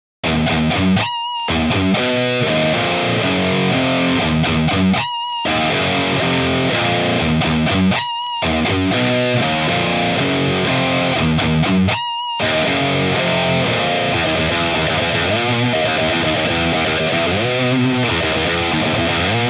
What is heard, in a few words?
Lead HiGain Bright; Mercury2CP